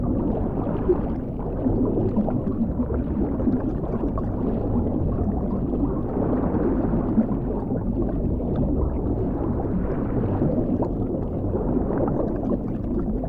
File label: Underwater Loop; World Seeder Descent